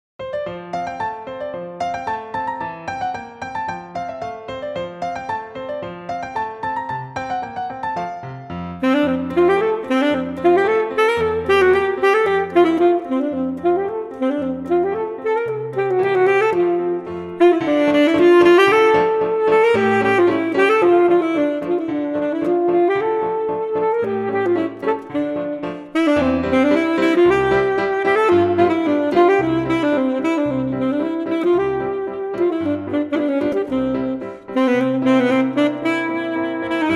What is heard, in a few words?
Petit Jeu Full Sax